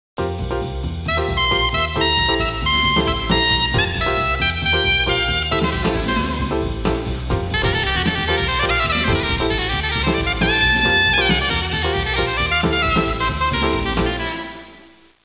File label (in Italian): suonerie musica jazz